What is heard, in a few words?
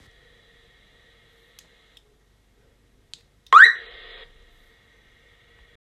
redphone switch to rx